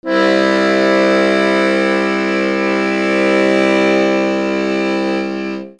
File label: MUSICAL